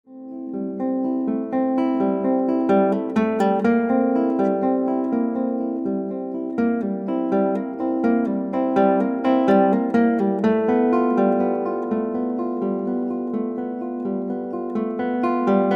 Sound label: Guitar